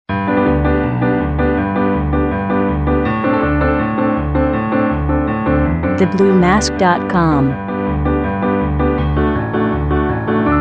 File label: horsey race